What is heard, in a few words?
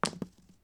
die Throw, Casino; Kenney's Sound Pack